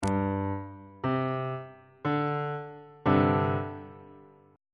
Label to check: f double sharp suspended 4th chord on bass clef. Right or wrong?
Right